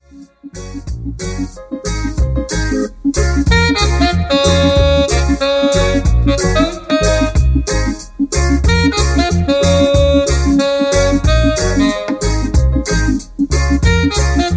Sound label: Chanting Down With Horns